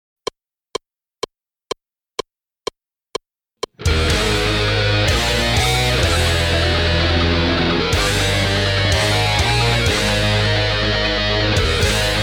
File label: WAH